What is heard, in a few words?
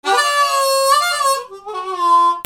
MUSICAL